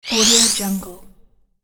replenish health to restore life game sound

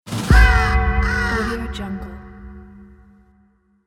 dark raven hit

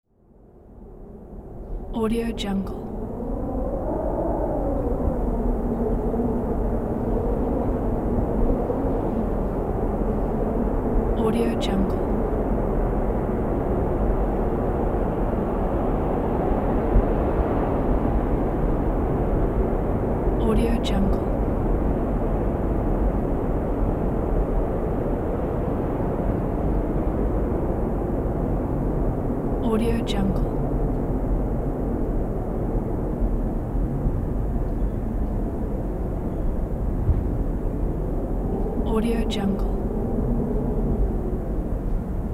tunnel ambience; satyrsound

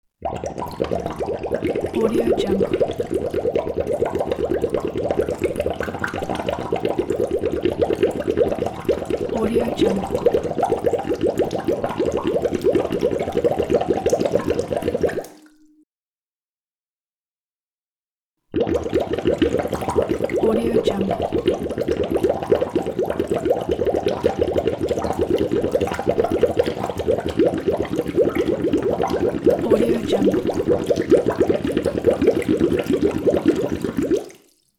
bubbles

